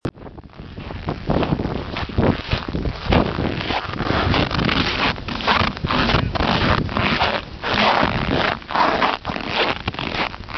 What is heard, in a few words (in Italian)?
passi